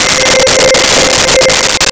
Echo Drops C5; Nintendo; July 2020 Gigaleak

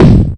crash boat; Nintendo; July 2020 Gigaleak

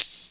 tambourin, c4; PPtambourin; Nintendo; July 2020 Gigaleak

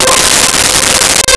Warm Pad C5; Nintendo; July 2020 Gigaleak

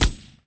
m60, chain gun fire; weapons

